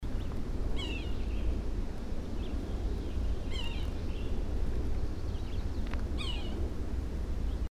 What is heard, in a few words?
Yellow-bellied Sapsucker nesting; Garrett MD